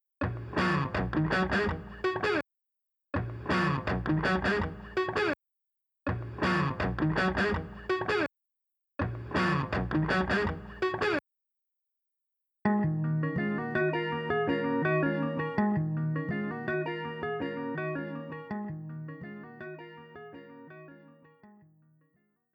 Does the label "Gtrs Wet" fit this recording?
Yes